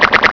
SLRP SLRP

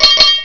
PING PING; psion cd vol1

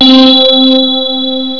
TING

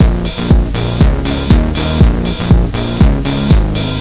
Beat Melody

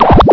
BLUBB

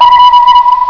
U-BOAT; psion cd vol1

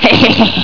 LAUGH; psion cd vol1

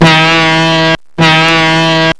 Steamship

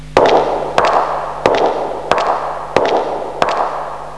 WALK

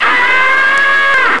Aaaaaa! Man

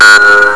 ECHO BEEP; psion cd vol1

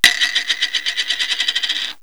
STOPPER; psion cd vol1

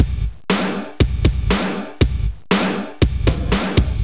Drum

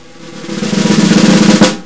FLOURISH; psion cd vol1

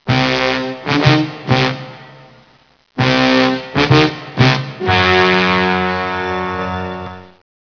Tension Melody

